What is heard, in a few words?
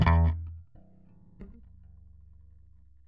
bass kickin; radio 101